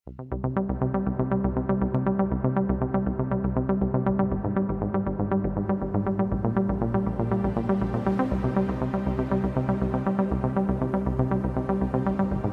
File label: electro